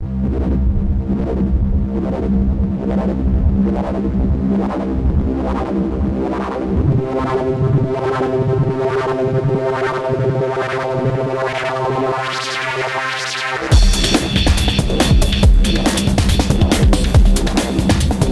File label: filter stereo; control the filter cutoff frequency with a LFO, and to vary the LFO depth rhythmically; midibox sid